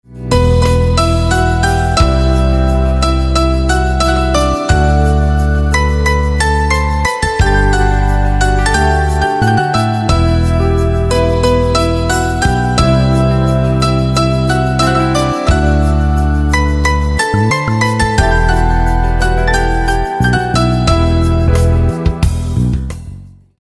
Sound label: Piano Instrumental Ringtone